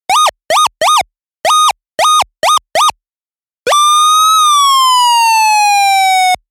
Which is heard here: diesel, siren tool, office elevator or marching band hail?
siren tool